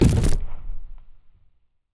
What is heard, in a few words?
Explosion Body No Fx; Go Record